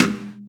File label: Percussions